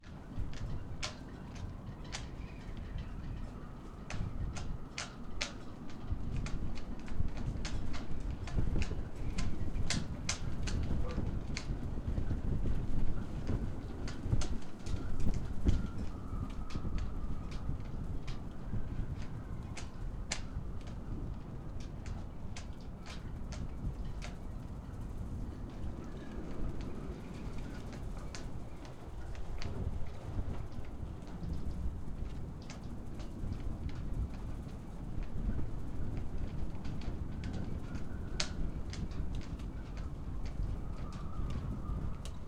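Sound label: Wind, Exterior, Marina, Masts, Wires, Buffeting, Whistle, Clank; Hzandbits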